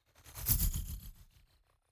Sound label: fly by insect drone flutter; swooshes-swishes